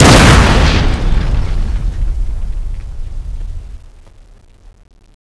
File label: patrol drone throw exp; cstrike